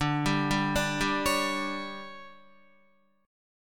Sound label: D Major 7th Suspended 4th, D, down, Guitar; DM7sus4; arpeggiated; JGuitar